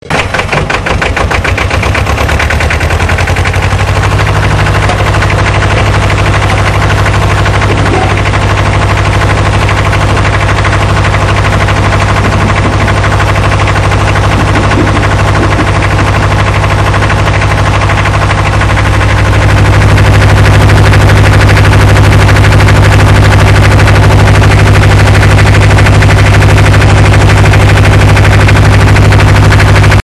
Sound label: generator; alpspektakel